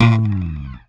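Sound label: slap, bass; Xsample VOL-1; SLAP+THUMB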